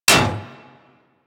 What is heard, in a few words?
Bullet Impact Generic Enemy Shield; Surface